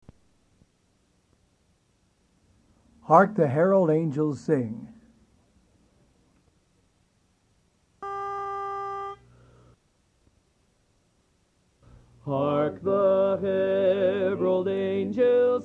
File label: Hark the Herald Angels Sing- Lead